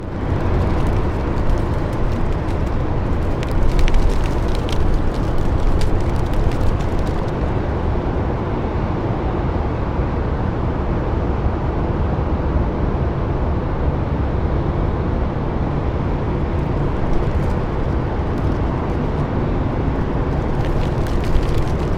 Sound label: cottonwood spatter